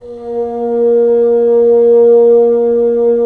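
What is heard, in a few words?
wind bassoon; LA Composer vol. 3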